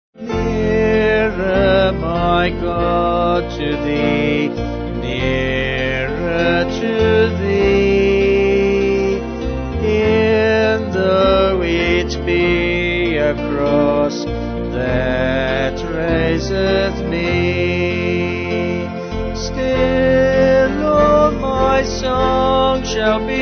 Nearer My God Vocals

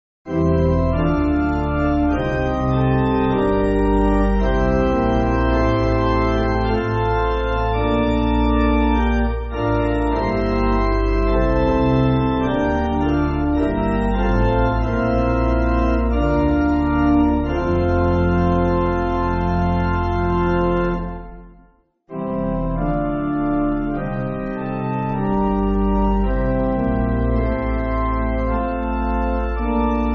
Pipe, church music

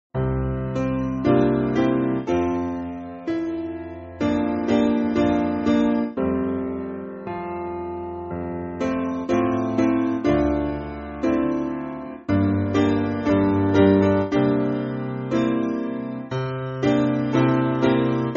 Piano, Backing Files for Churches

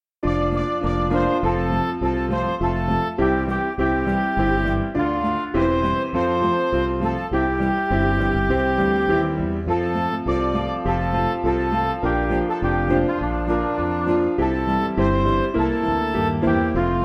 March On Forward, Chinese, Midi

